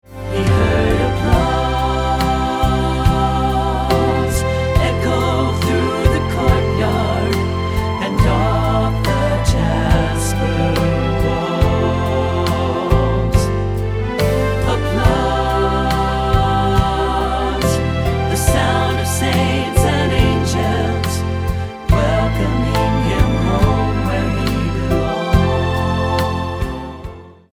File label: Applause